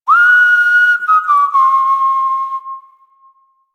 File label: Camp Whistle; Warframe; Quests; The New War; Drifter